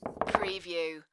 Billiard, Pool, Snooker - Put several balls on table